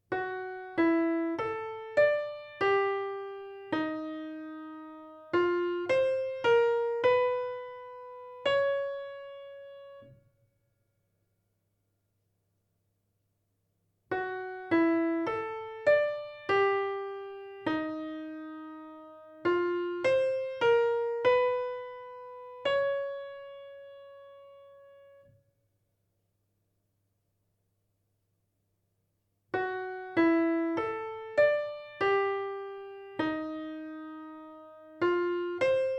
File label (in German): atonal